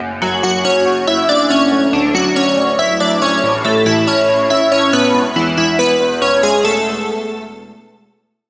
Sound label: Key, Serum 2; Key - Transformation; Routed Sound; Serum 2 Expansion - Reality Maker Vol.1